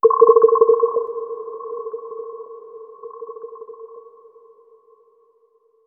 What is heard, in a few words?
Forest Amb